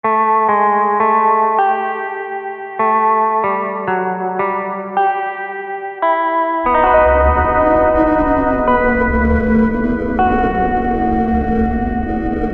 guitar

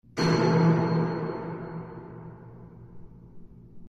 clang

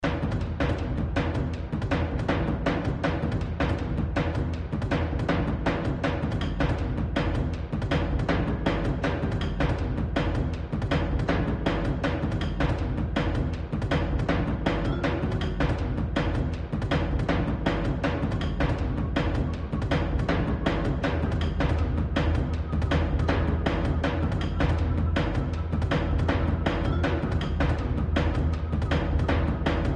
boar chase

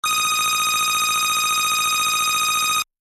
points

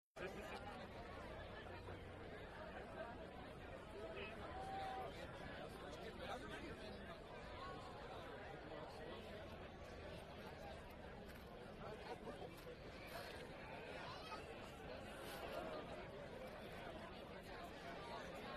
crowd

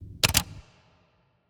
camera

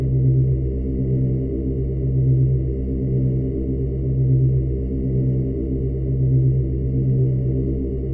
hum

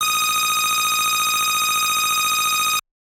points

